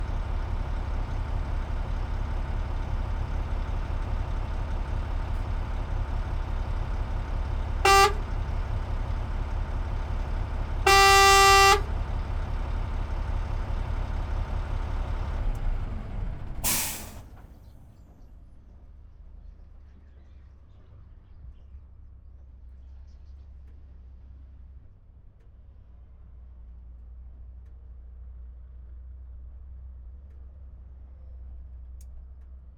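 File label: Volvo dump truck exterior horn idle shutdown; Volvo A30E; Schoeps; Pole Position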